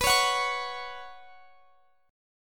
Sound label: B Suspended 2nd B Guitar Drop D